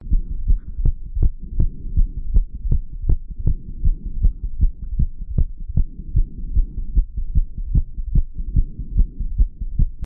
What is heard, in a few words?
heartbeat rapid heart after exercise